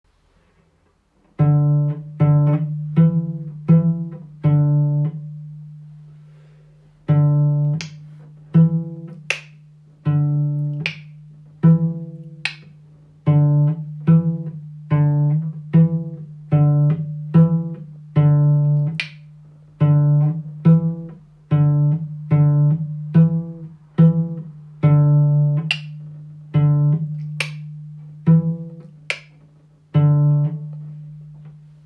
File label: pizzicato, cello; gezupft